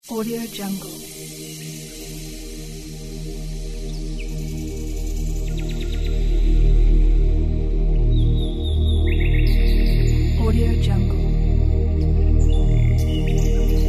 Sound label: walking in the forest; walkingnatureworld